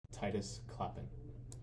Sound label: Clappin; baseball